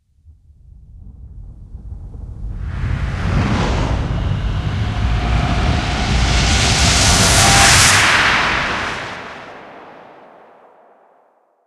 Thundersting; Doom 3

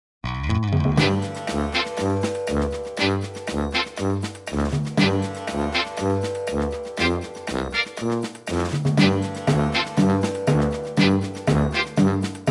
BGM mission clear; Yo-kai Watch Busters Akanekodan

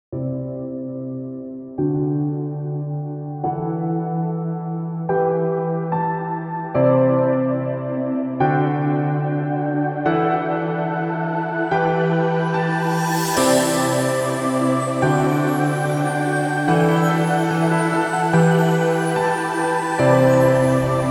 (DUBSTEP RMX!!)

